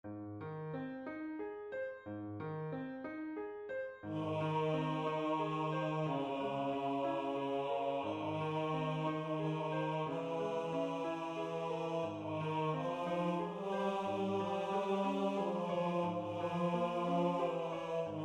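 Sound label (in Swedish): Jul jul med piano Bas 1; Jul, jul, strålande jul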